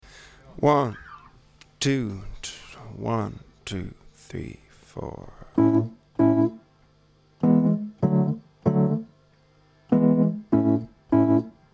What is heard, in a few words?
a very short pop song